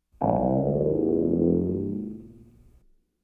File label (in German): weltraumrülpser, Science Fiction